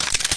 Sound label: clip hit, ak47; cstrike; csgoclassy